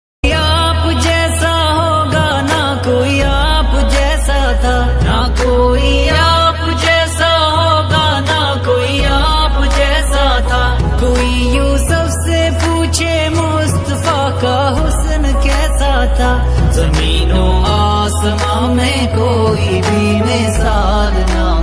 Islamic Naat Ringtone

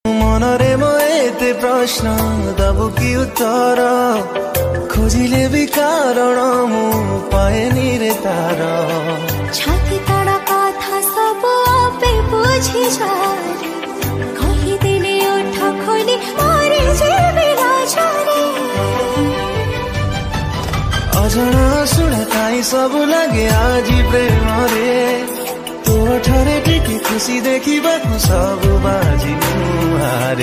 Odia Song Ringtone

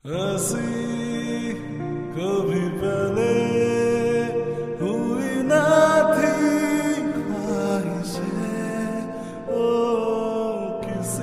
Lofi, Hindi Ringtone

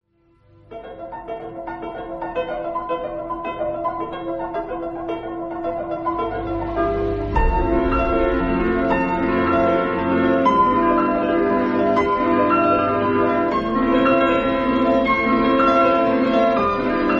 Slowed Instrumental Ringtone; ringtones

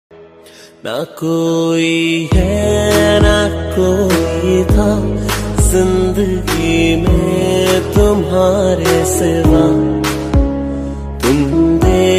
Romantic Ringtone

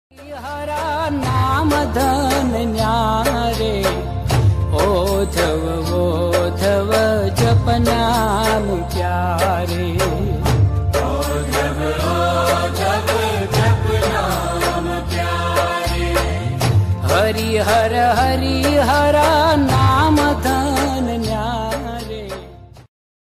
Bhajan Ringtone

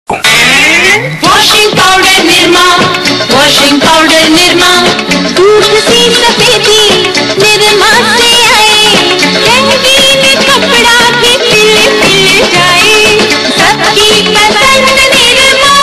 Telugu Ringtone; Washing Powder Nirma

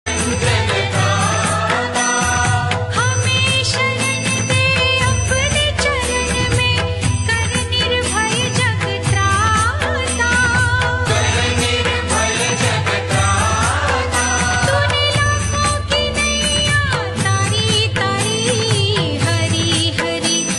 Hindi Bhajan Song Ringtone; ringtones

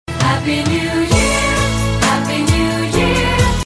Happy New Year Ringtone

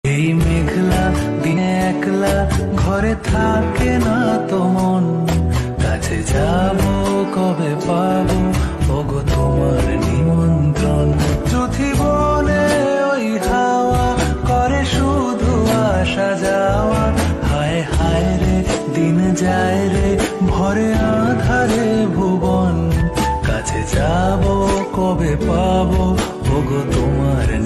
Bengali Ringtone

